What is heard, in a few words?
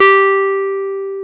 Jazz Guitar